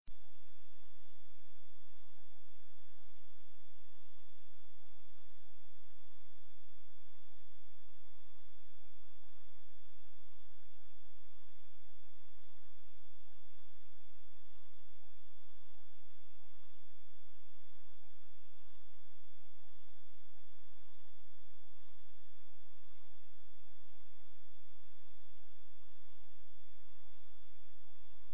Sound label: beep